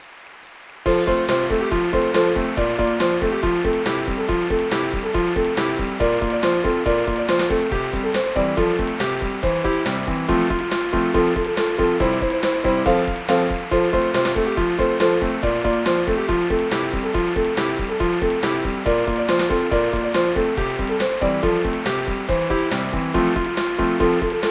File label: Train